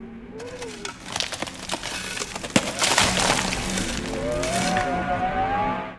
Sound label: Shovel